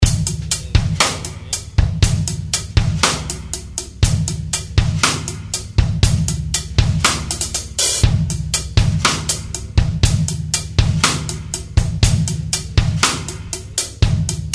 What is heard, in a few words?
drum; Drum/Bass